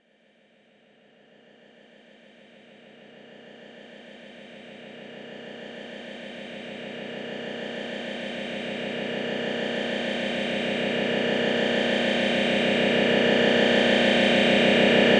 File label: Science Fiction Deep Power Drone, Drone Sound Effects